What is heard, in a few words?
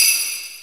suzu, Bells; Misc Percussion